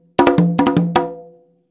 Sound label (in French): frise, congas